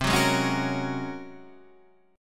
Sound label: G sharp 11th C